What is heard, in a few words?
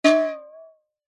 cartoon metal impact wobble